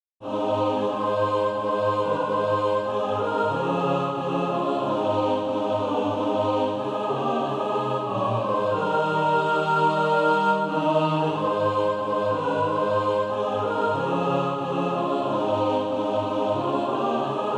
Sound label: mixed